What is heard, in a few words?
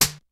KICK SNARE; Kid Nepro; Elite; KICKS SNARES